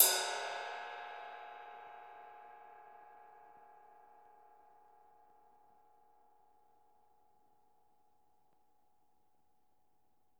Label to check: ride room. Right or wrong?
Right